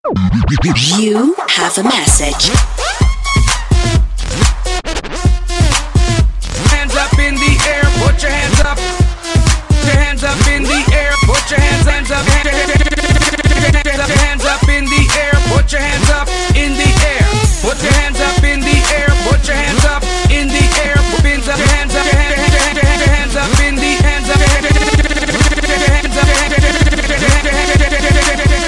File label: New Sms